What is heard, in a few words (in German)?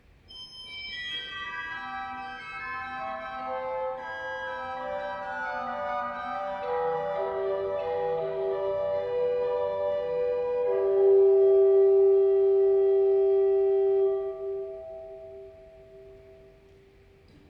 sesquialtera 2f, Sesquialtera 2f